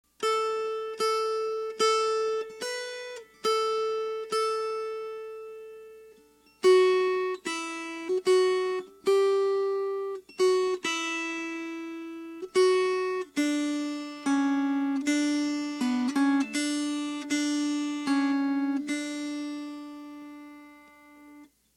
Melody

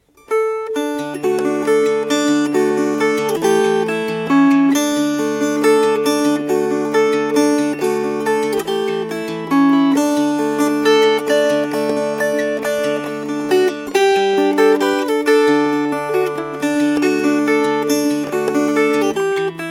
Red Light Saloon Fingerpicked

